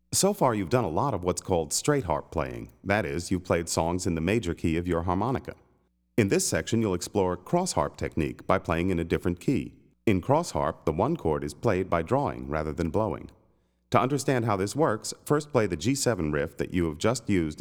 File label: The Blues, Harmonica; Play Harmonica